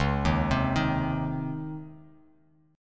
C sharp Major 7th Suspended 2nd C sharp, Bass Standard; arpeggiated; chord